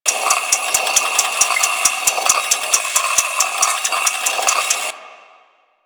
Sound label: Lantern Shine Timer Loop; Warframe; Emissary Season Two